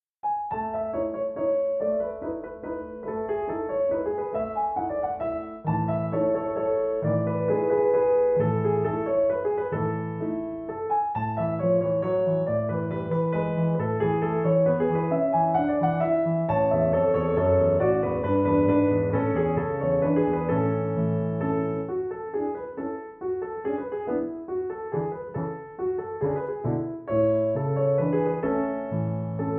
Norwegian Mountain Dance No 3; Edvard Grieg (PD)

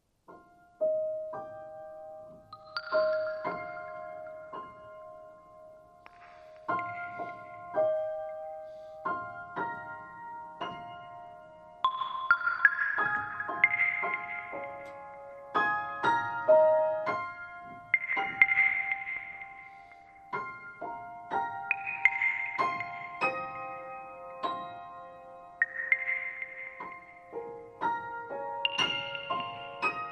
Glassy Drops

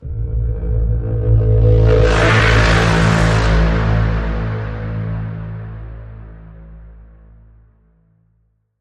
Bend, A

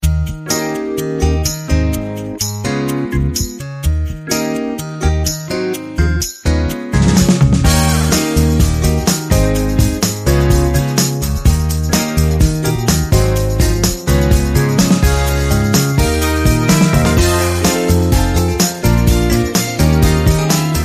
Happy Move

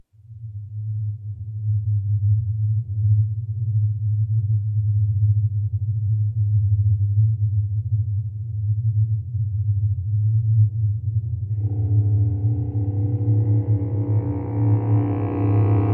Percussion and Bass Only

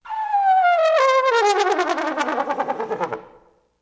Trombone Squeaky Gliss Down With Vibrato

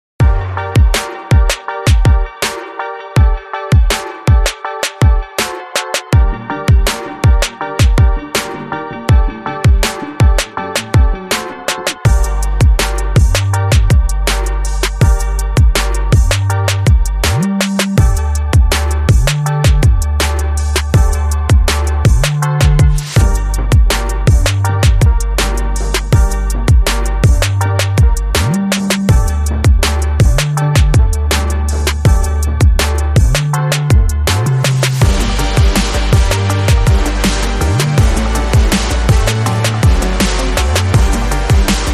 Brass Kicker

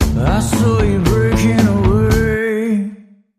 Bumper

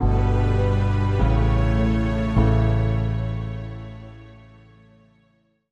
Bumper

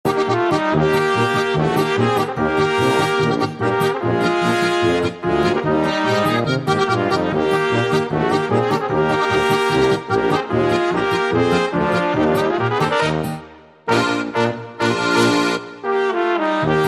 German Polka

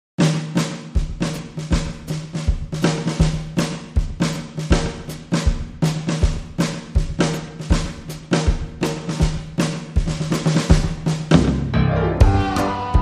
Instrumental

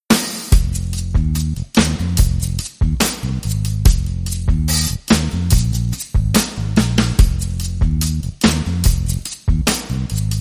Sax DnB

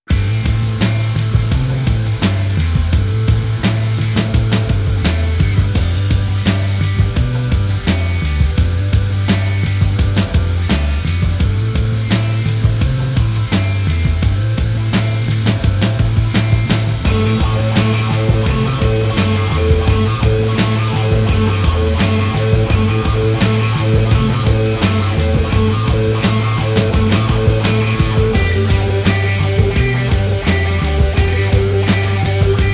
Guitar

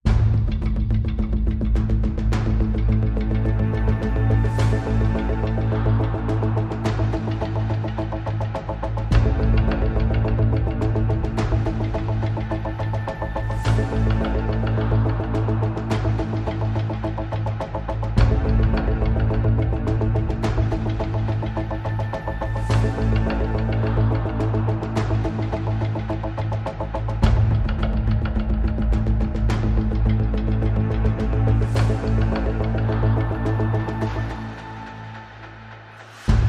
Perc Pads and Pulses Only

